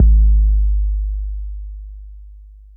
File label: BD 808 Deep